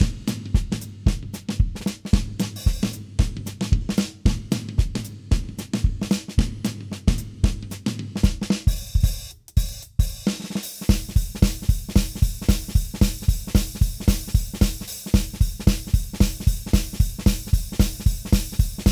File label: Battle Perc; Plants vs. Zombies Battle for Neighborville